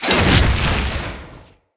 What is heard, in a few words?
Fire Blade Throw; Characters; Enemy; Abilities; Sinecure; Banneret